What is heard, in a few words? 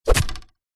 warfare spear land soil vibrate; zapsplat